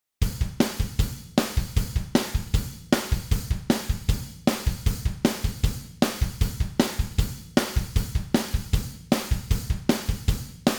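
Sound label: Drums Birthday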